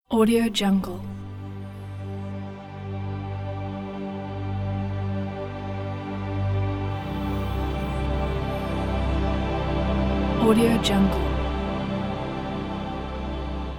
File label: inspiring ambient soundscape; edensoundproduction